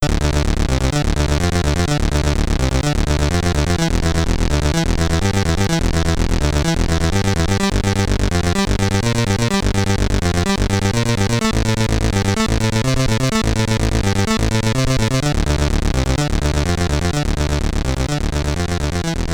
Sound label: SID Stereo; katakis; commodore 64